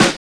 Snare, Drum and Bass

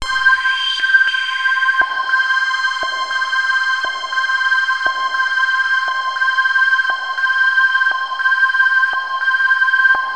Access Virus B; Synthworx

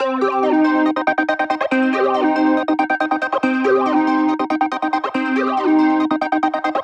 140BPM C; Vengeance - Essential Clubsounds Vol.4

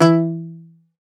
Greek Bazouki, Plucked Strings

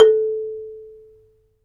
MARIMBA; ILIO

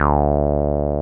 303 OW D3F, 303 OW BS; Drums In Your FACE!

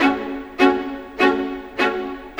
Viola, Violins, Orchestral, Rock Pop; Sonic Foundry (Sony Creative Software); Orchestral Series VOL-4 - Rock and Pop

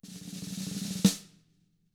ROLL, SNARE ROLLS; ILIO; Double Platinum Drums 2

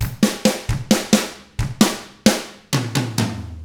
SLOW ROCK, Drums; ILIO; Double Platinum Drums 1

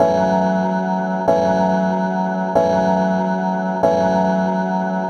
Kling Klong, Pads; Sonic Foundry (Sony Creative Software); Synchro Funk

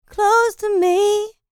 LICKS, Vocal R&B Dance; LT DANCE

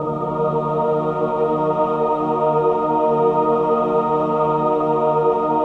min chord, Symphony of Voices

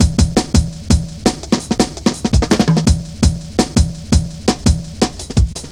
Drum Loops; Zero-G Jungle Warfare 1, 2 & 3

